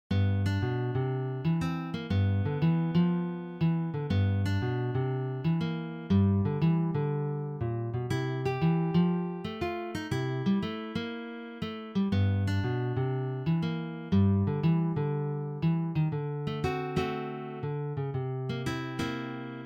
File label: PICKING THE BLUES